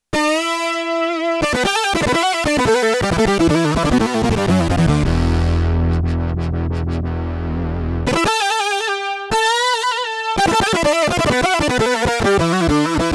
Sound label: LEAD-SYNTH I, Korg DW-8000; Factory Patches